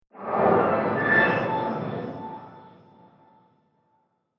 Whoosh Transition Sci Fi Metallic, Whoosh Transition Metallic Airy Magical; Whoosh Transition Metallic Airy Magical Ethereal Mystical; Whooshes